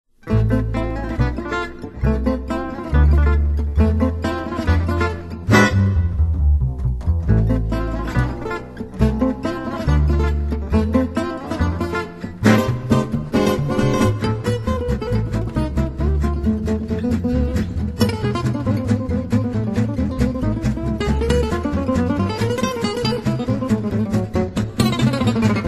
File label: Jazz Tsigane